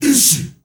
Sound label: ISHIISHAA L, GRP CHANT, Heart of Africa; Spectrasonics; Heart of Africa 1